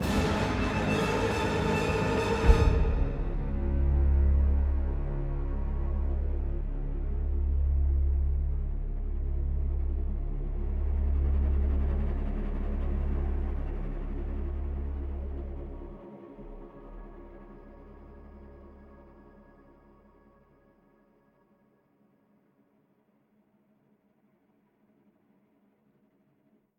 Intense, game soundtrack; Pillars of Eternity

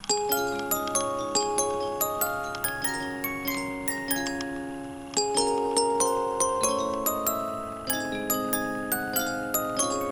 Boxy Jingle; Project Playtime

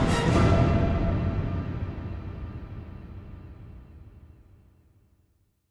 Huddle Success; Mass Effect 2

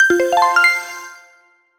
Jingle Again; Clubhouse Games: 51 Worldwide Classics

